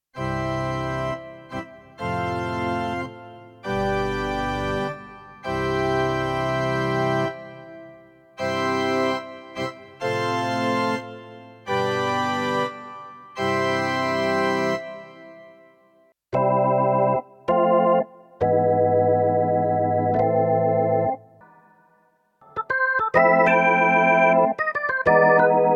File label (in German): Orgel